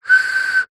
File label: office stationery felt tip pen lid air blow whistle